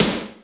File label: Snr Media Hype, Alesis D4 drum machine